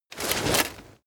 plate armor movement rustle

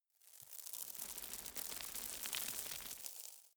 cave oneshot dust

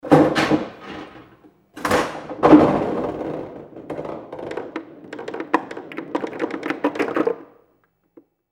billiard playtable release

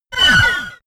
sci-fi laser shot

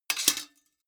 sword sabre guard parry soft

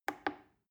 plastic button little click soft slow

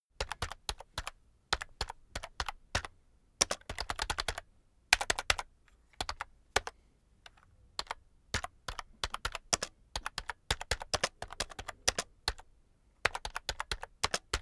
keyboard external type sequence slow heavy

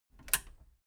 metal button radio release power on

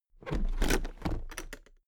toolbox open heavy

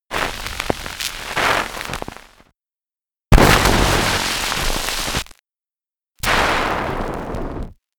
scratch

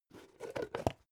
plastic bowl open long

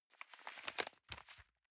envelope swap soft

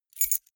key ring small place

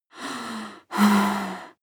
breath female moaning breath

